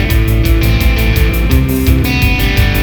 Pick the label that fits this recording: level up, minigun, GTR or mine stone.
GTR